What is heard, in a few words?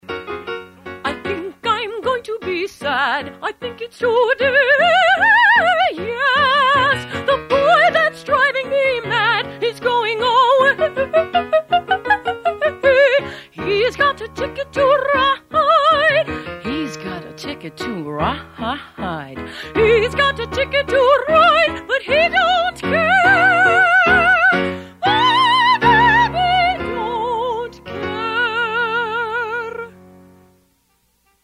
Operatic Soprano/sexy